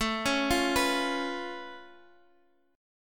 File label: Db Augmented 7th A